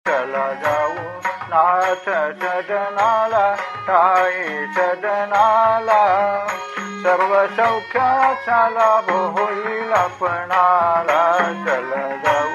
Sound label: Bhajan